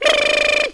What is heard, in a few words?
brrrrrr, Voice